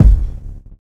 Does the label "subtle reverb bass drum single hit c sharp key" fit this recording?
Yes